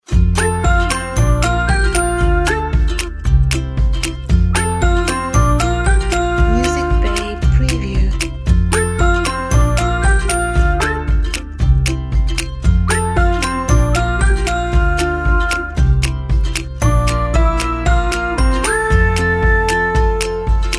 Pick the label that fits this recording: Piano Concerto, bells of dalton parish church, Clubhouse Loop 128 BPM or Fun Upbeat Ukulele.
Fun Upbeat Ukulele